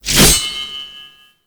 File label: Hatchet Whoosh; Warframe; Corpus; Venus Hatchet